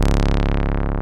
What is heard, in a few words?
TB303, Synth Basses; E-MU Formula 4000 Series Vol. 2